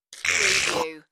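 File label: Weird Slurp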